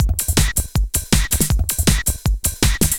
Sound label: LOOP, Eurotech; Sounds Good